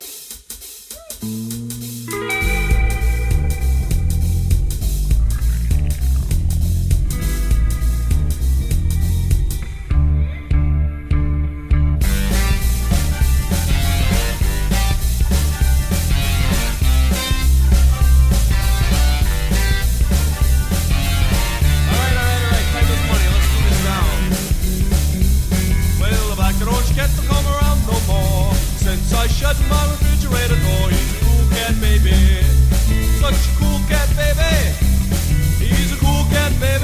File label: Ring Music; WCW nWo Thunder; PS1